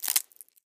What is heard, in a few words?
pick from bush, sweet berries; item; Minecraft; Conquest Default